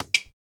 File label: Shoe Squeak; Ed Edd n Eddy; Adromulus Pack